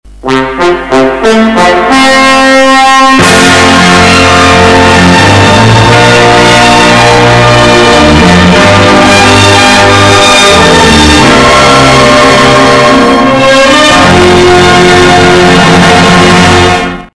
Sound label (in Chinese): 新闻联播片头曲